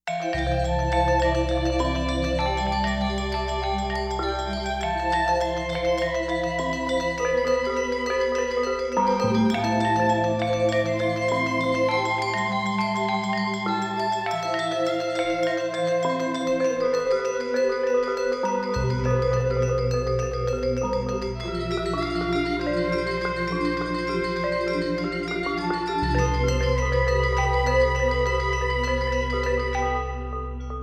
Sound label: Gamelan Violin